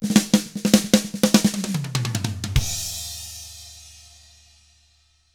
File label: SHUFFLE, Drums; ILIO; Double Platinum Drums 1